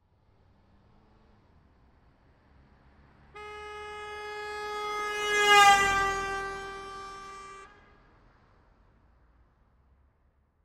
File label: Ford Escort 1986 Drive By Right To Left 55 Mph